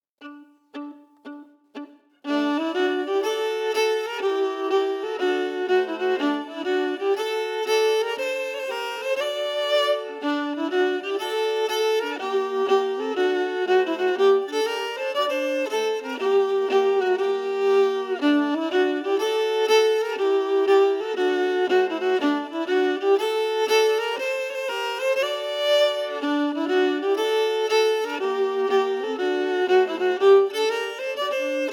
Schottis melody emphasized; Schottis fran Lima

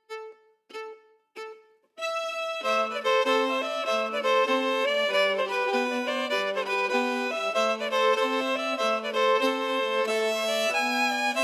harmonies

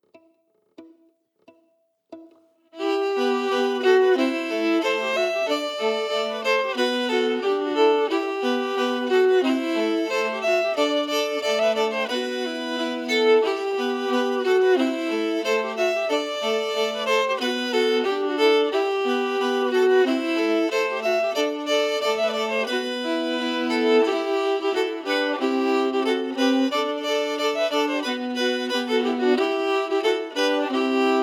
harmonies emphasized

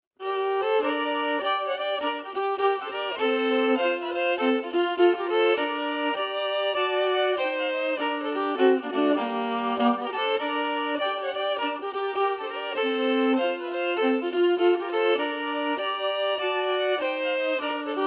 Harmony emphasized; Miss Sophia Campbell

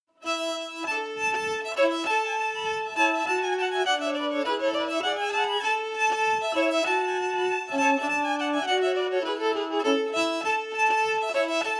Reel harmony emphasized

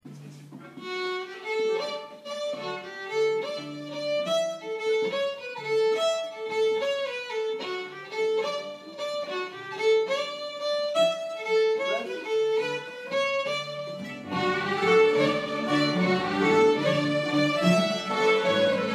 Jig

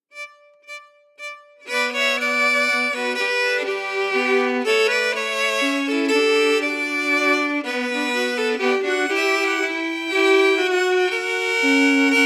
Tourner a Trois harmonies

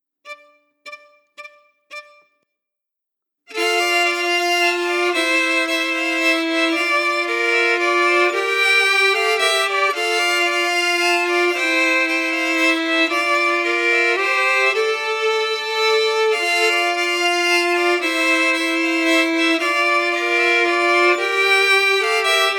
Vals harmonies emphasized

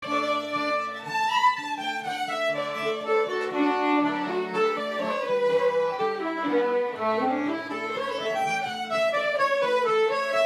Waltz

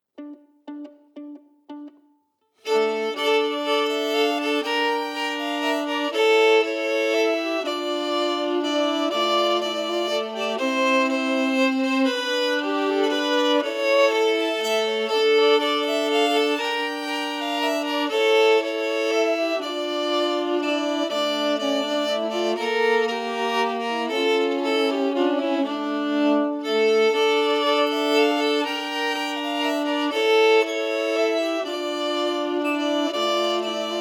Waltz harmonies emphasized; Hokpers Waltz

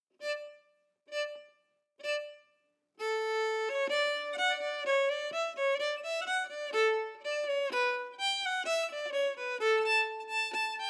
Reel slow; Reel de St. Joseph

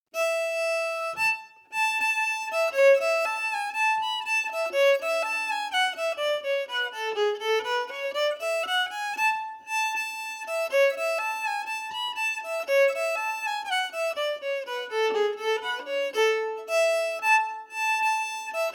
Reel slow

